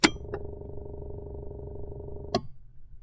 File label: kink brrrrrrrr teck